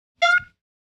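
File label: 133 Dance Electric Guitar F; Dance DJ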